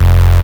Bass Cuts; Vengeance Samples; VES1 Instruments

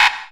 Synths C; Vengeance Samples

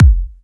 Hard Kicks; Vengeance Samples

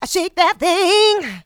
voc, female vox, Vocal House

